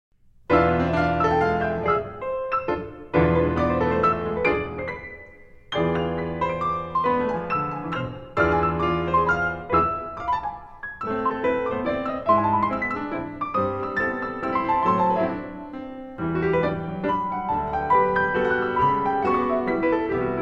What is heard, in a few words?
23 pianos